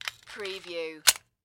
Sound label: Pistol Smith Wesson 40